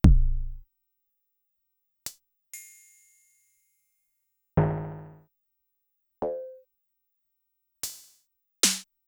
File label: LXR; Sonic-Potions; Eris